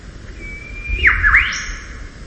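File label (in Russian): душевный свист птицы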